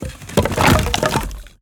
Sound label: forest wood log debris falling; bluezone; sonniss gdc